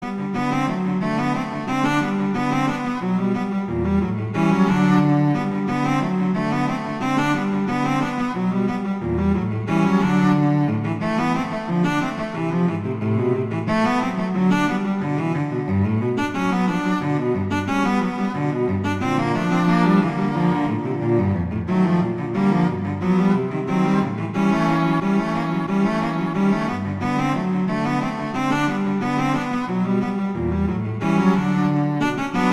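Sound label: Etude, cello; Popper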